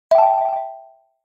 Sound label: Fold close; Nokia; 7390